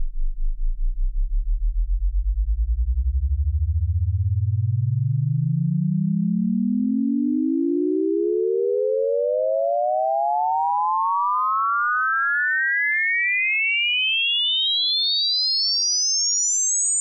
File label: stereo 20 20k